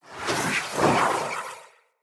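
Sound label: Staff Caster Projectile Whizz By; Weapons; Player; Staves; Starter Staff Caster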